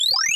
arcade sound

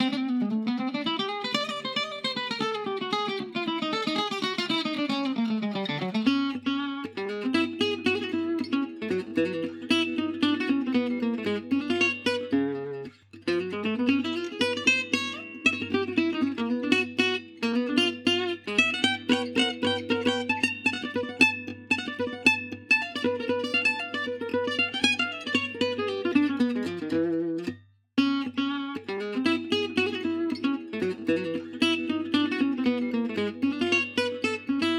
citarra pizzicata

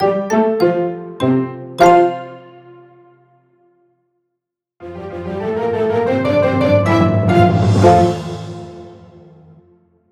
kids show intro and outro

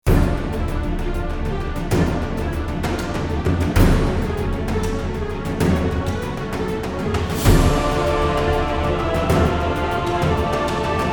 a dramatic twist

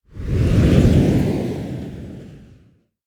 air flyby whoosh

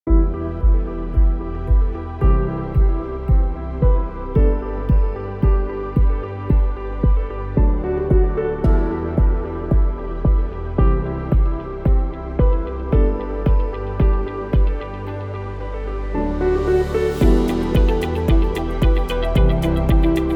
ambient background corporate short; audioblocks

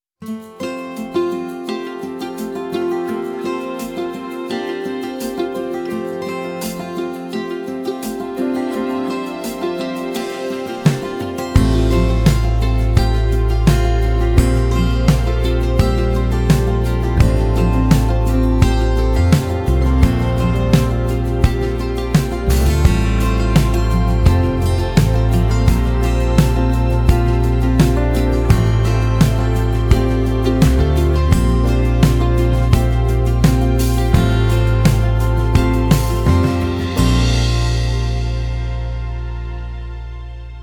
inspiring uplifting light acoustic guitar

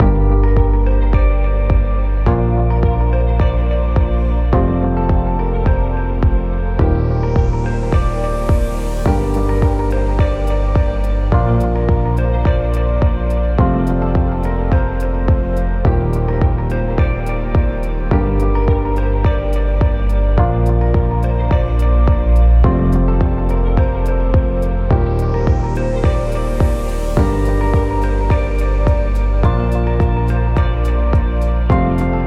background chill corporate; audioblocks

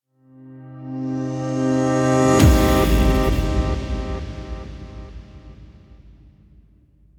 simple movement

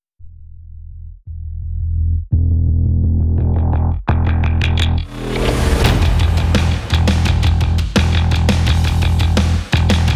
sports action rock trailer

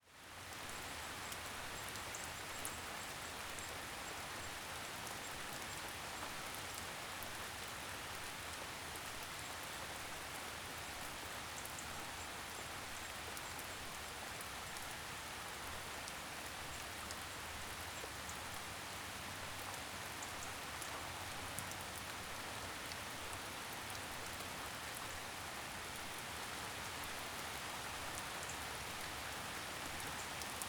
rain in nature ambience

